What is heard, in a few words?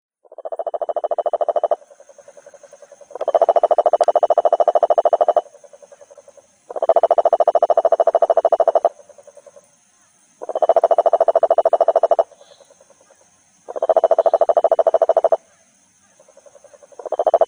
Rhinella schneideri